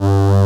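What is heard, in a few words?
G1, SYNTHESIZER, analog STRINGS; AKAI S6000 CD-ROM - Volume 1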